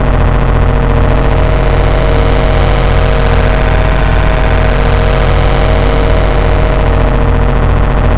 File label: main beam, ambience